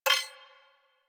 Hub Comp Beep; Warframe; Tenno Hub

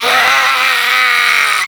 Infested Runner Attack; Warframe; Runners

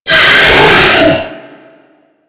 Attack Scream; Warframe; Stalker

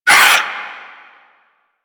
Swarm Vocal; Warframe; Man In The Wall; Limb Vocals

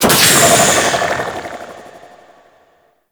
Archwing Stealth Forward Transition; Warframe; Vehicles

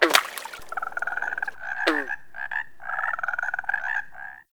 Wetland Forest Day Frog; Ambience

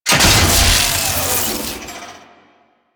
Lightning Deactivate; Grineer Forest; Warframe; Veilbreaker; Grineer Forest Thumper; Kahl

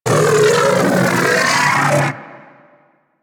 Arch Gun Alt Charge Full; Weapons; Warframe; Archwing; Hildryn

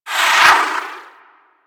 Rocket Whizz By; Warframe; DE; Vehicles; RailJack; RailJackEnemyShips; Grineer; Space Fighter Dredger Agent

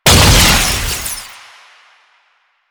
Explosive Impact, Weapons

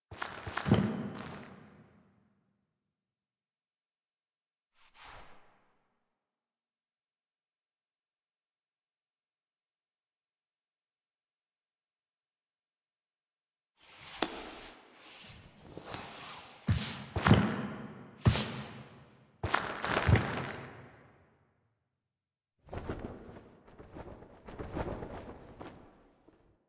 Foley; Warframe; The New War; Finale

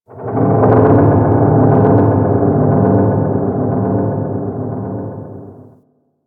dark telemetry; Warframe; Corpus Railjack; Ambience

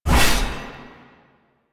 Blade Swipe; Melee; Dagath

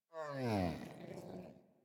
Catbrow Pet Yawn; Warframe; Pet Ship

